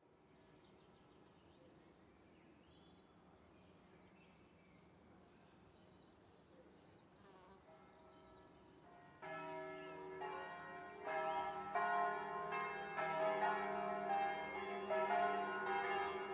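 Ambience, Town, North Italy, Birds, Light Traffic, Church Bells Calling to Mass, Surround; Sonik Sound Library; Spatial Towns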